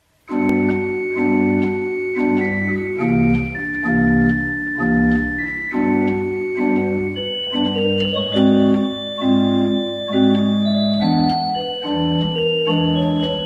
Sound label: Jazz Pop